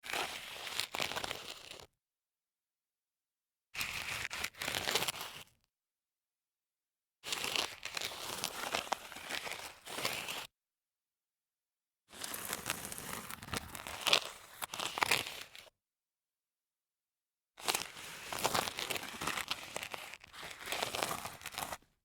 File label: sneaker foot slides concrete